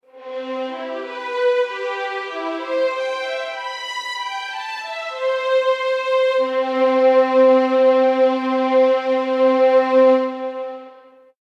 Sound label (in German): Legato Violinen